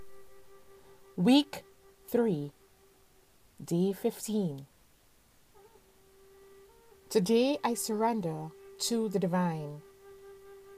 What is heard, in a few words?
healing mantra